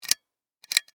Light Pull Chain, Foley, Light, Switch, Pull, Chain; Lights (Sound Effects:Household); BlastWave